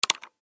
Button Switch Click, Button Switch Click Multimedia; Web Interactive; Blastwave